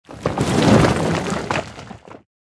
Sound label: rock boulder pass by